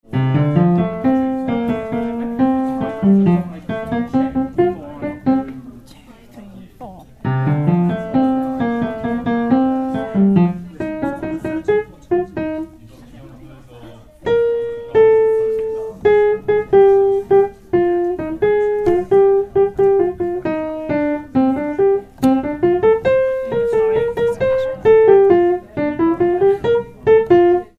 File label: Pipe mid